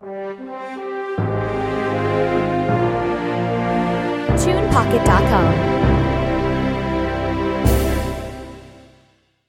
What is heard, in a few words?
Cinematic Orchestra Video Intro; TunePocket